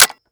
hit, mp40

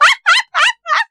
laugh

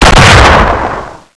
dbarrel, weapons

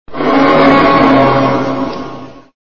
die

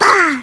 baang

